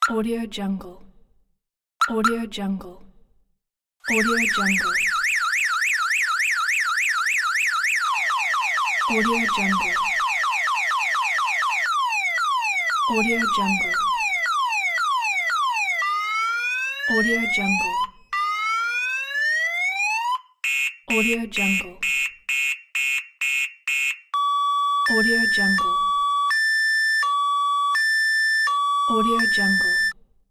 car alarm

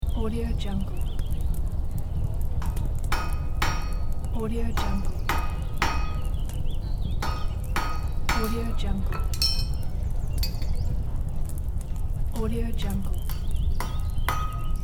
blacksmith medieval shop ambience loop

